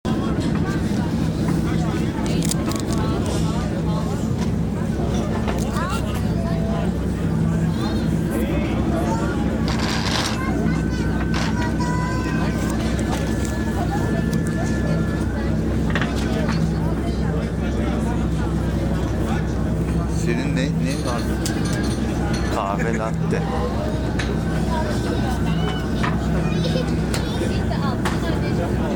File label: bosporus shore ambience